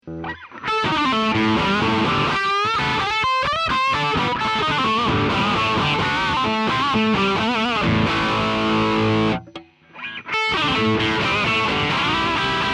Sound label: Drive Rear; P-Rails